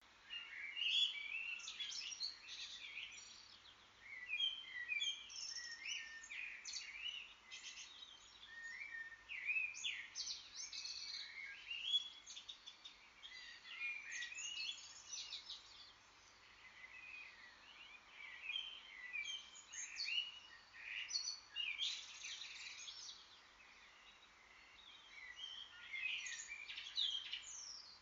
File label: Morning Chorus Birds; Bird Sound Collectors